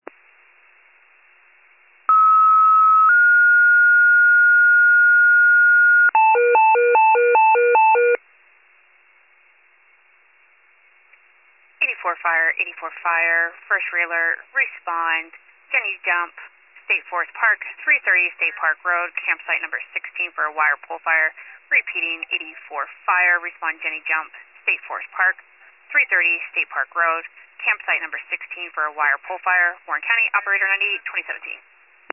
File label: fire, pager alert